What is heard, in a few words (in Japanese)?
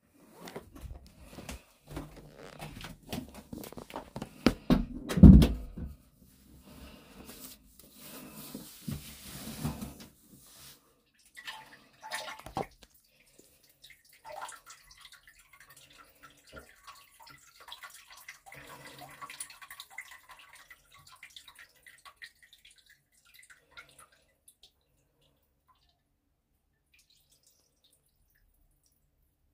寝起きな！オシッコですッ！